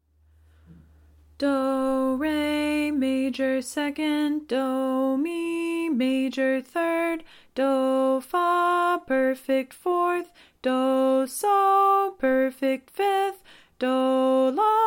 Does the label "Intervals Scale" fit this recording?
Yes